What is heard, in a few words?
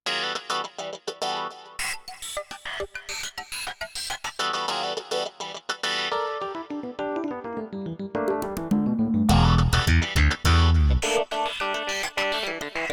Funk of the Future